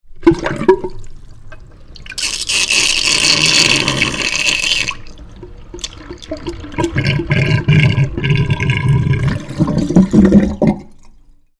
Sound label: Water Gurgling down Sink Waste hole; Sucking and glugging down waste trap; Sewer